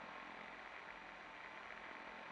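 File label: night vision loop; STALKER; IWP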